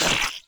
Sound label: range attack success